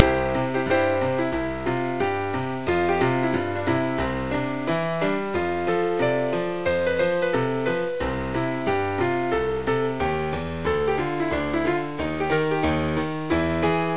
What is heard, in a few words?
Saloon Ragtime; freddi fish 4 the case of the hogfish rustlers of briny gulch